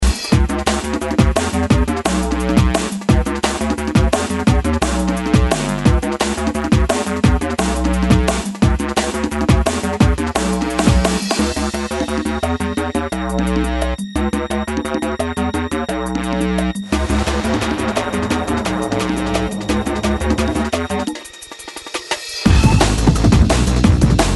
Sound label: drum